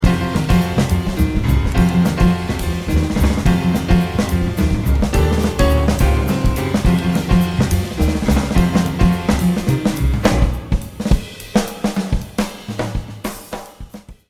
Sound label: drum shout